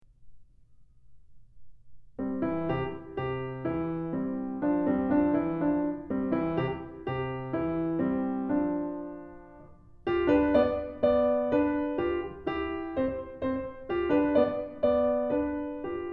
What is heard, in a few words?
Duet; Keith Snell Level 00 Baroque Classical; In Contrary Motion; Reichardt